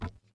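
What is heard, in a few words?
Metal run left, footsteps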